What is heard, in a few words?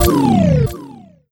Gamble Lost; LuckyPunch; G-Star